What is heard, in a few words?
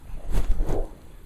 wing woosh